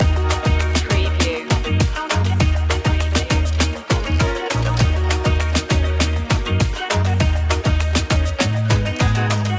cool jamaica style loop

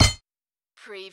Button Sound - Metal Small Anvil

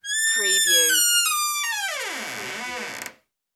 old squeaky wooden door

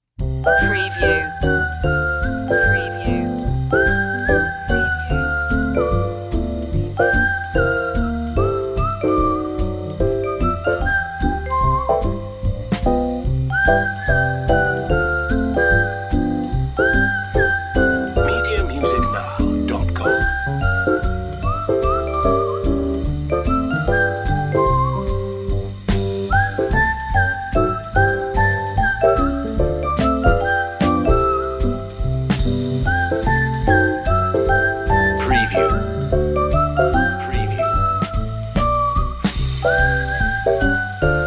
playful sunday

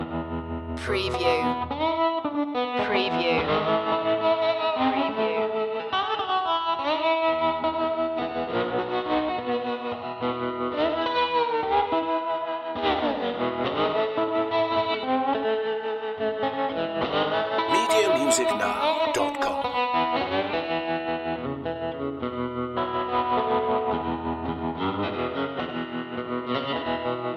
blues loop

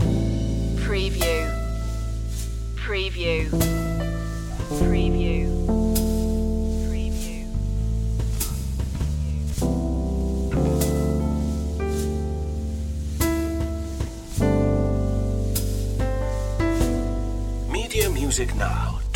a simple love song loop

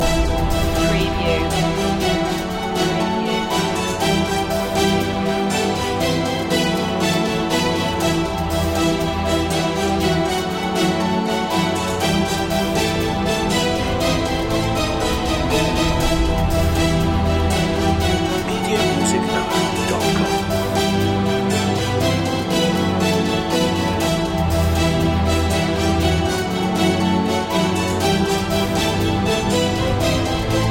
action movie trailer loop

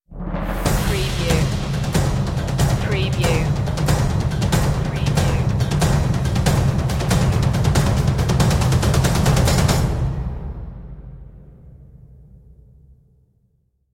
treasure map riser

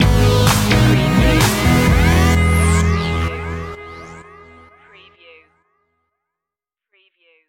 robo-disco stinger

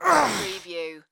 Male Grunt, Human Injury, Pain, Vocal Ugh, Ouch

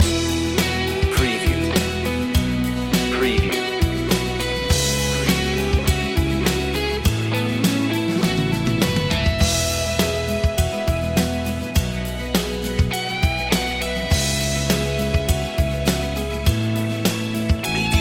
white feeling loop

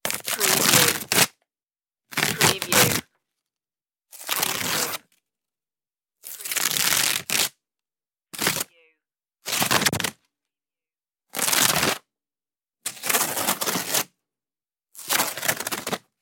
ripping cardboard

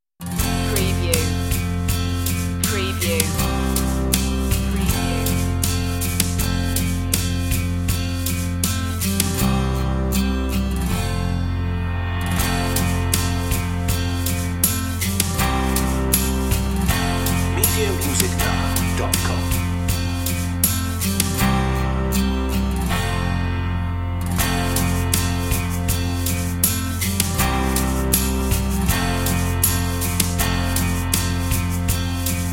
underscore version no sound-fx

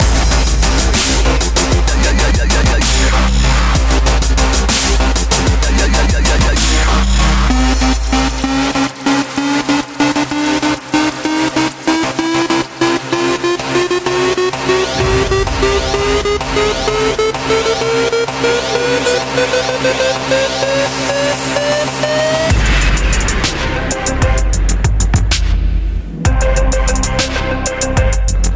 dubstep industries loop

